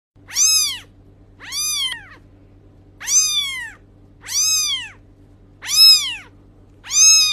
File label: Cute baby kitten meow sound